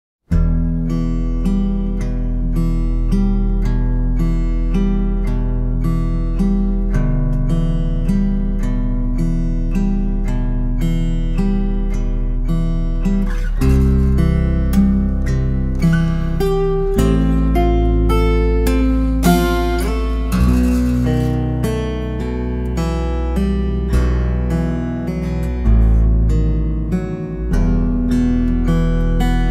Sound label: moonlight sonata on one guitar